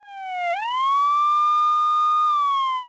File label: Scrolling Siren